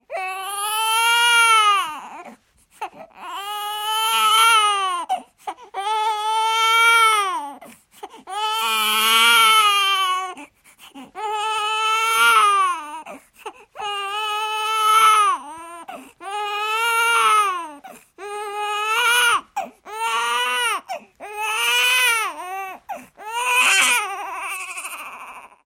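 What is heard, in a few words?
newborn crying sound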